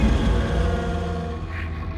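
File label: Music Volcano 120; from dust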